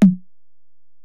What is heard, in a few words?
Tom, Alesis DM-PRO